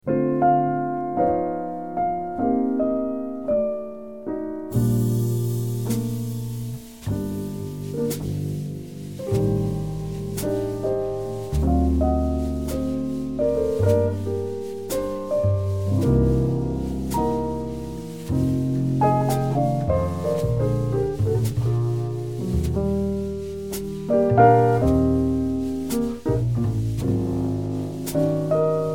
minus melody

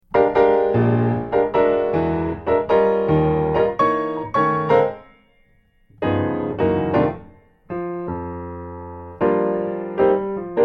piano solo

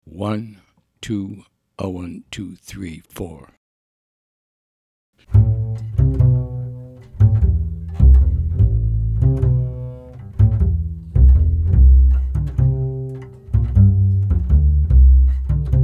bass only

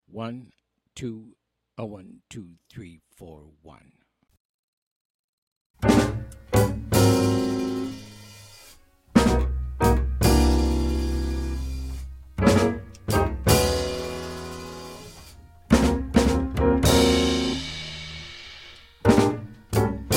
minus melody

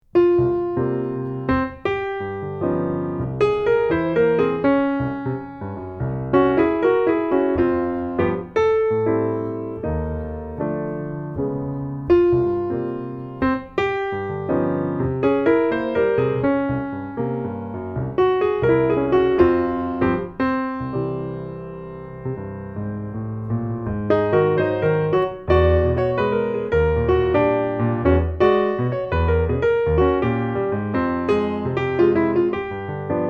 another drum beat

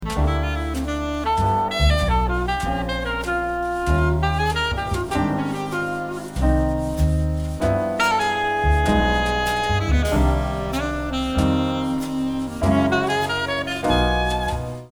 piano; footballs voicings

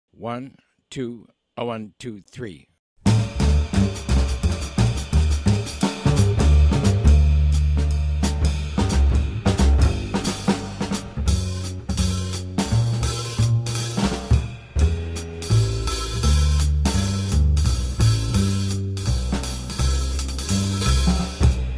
bass drums only

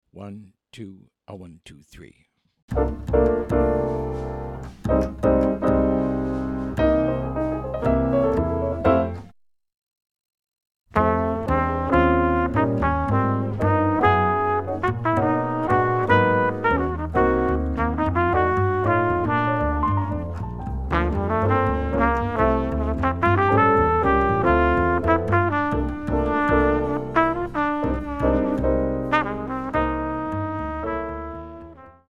minus drums

